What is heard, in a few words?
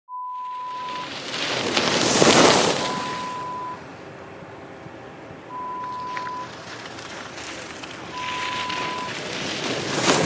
Ski Slope